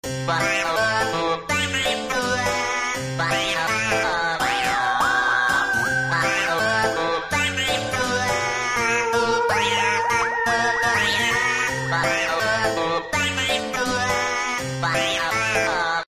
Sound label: Post Game (Win); Halloween 2022